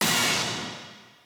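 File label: b stab1b, note A octave 5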